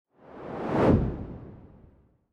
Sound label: Swoosh